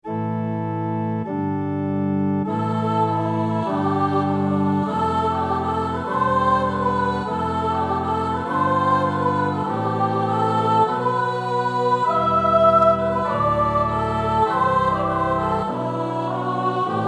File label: Alto